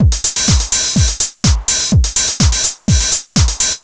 drum fortune full 125, Classic Chicago House Drum Loops